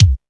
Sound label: DX MIX BD, DRUM; AKAI S6000 CD-ROM - Volume 1